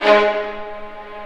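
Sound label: Vio spiccato G#2; Digital Sound Factory Virtuoso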